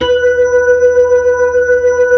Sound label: B-3, Blues